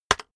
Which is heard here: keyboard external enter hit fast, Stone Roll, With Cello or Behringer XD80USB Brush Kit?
keyboard external enter hit fast